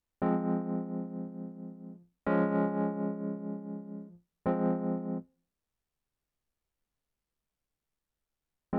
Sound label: Keys